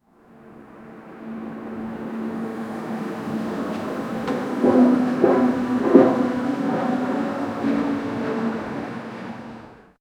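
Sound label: a small japanese town street, town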